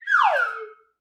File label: Slide Whistle Down; SoundsCrate